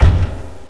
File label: Close Program; Diablo; Desktop Themes